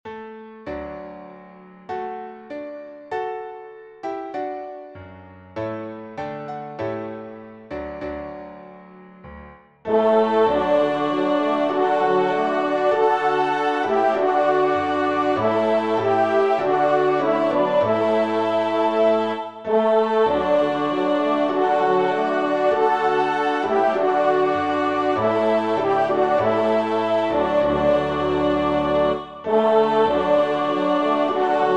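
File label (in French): Laudate Dominum, Voix Piano Trompe; Gounod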